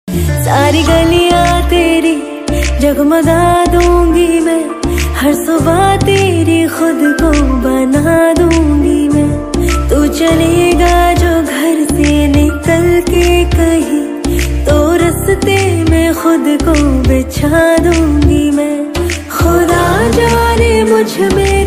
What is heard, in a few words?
Female version hindi ringtone